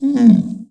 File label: AMBIENT; mahlendur